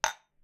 glass; Phasmophobia

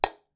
ceramic; Phasmophobia

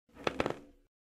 Creak Floorboard; Phasmophobia

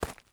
FOOTSTEP Trainers Gravel Compact Run mono; Phasmophobia

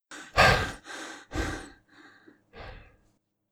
Male Breathing; Phasmophobia

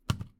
Cam drop; Phasmophobia

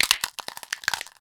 finger crunch; Phasmophobia

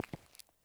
FOOTSTEP Asphalt Trainers Walk Slow mono; Phasmophobia

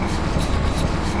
Sliding Metal Door Loop; Phasmophobia

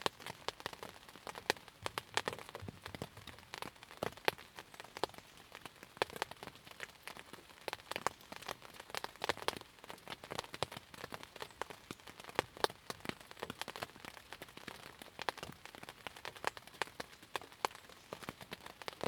Light rain on tent; Phasmophobia

